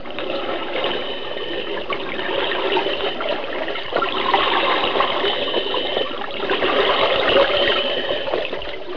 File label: water